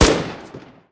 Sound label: tiger mg; weapons